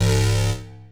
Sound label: stab; Dirt-Samples